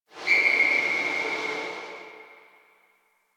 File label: Distant Car Squeal; Warframe; Ambience; Nineteen Ninety Nine